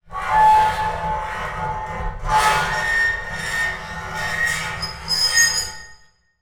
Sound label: foley metal scrape shrill